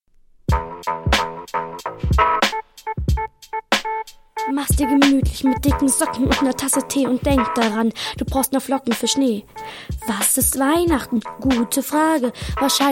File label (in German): weihnachtsrap; Weihnachtssendung; “Listen 2 Radio” Weihnachtssendung, Teil3; Medienkulturzentrum Dresden